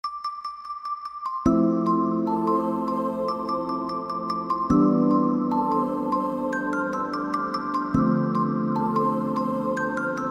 lullaby rock

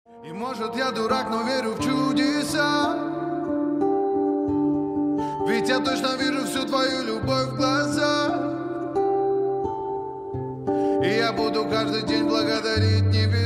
acoustic versija

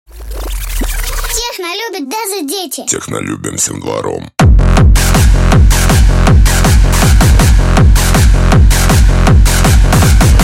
techno version

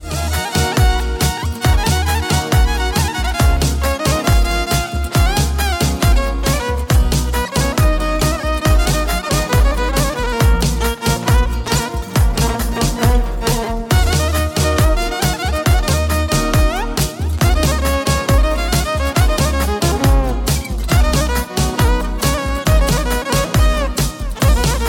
instrumental

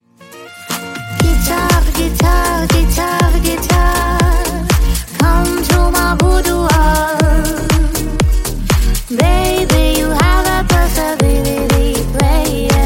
guitar

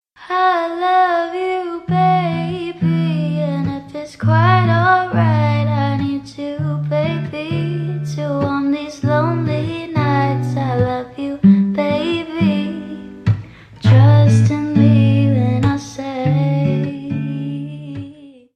lofi

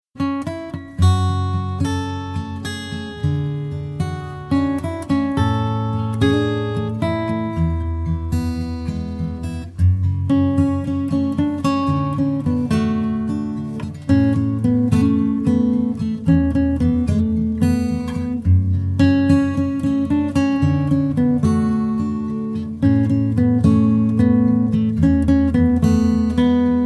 guitar

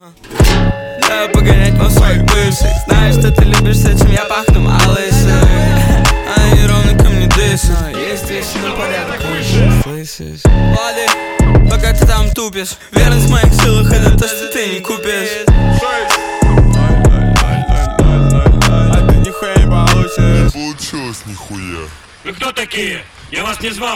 hahaha

